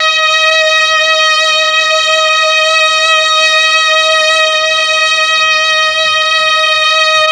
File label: MEL STR, Legendary M400; ILIO; Propeller Island